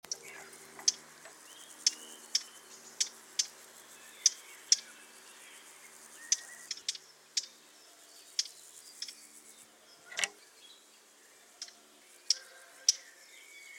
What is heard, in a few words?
Sylvia atricapilla; SETE